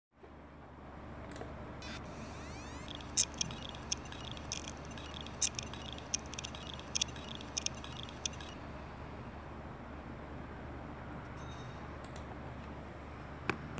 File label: Heads Seagate 2.5 250GB, damaged hard drive sounds